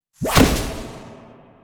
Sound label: banana hit; zoochosis; lazynap pack 2; NASTY BANANA PROJ